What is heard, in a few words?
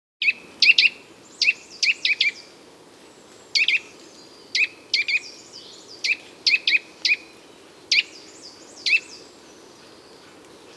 Nuthatch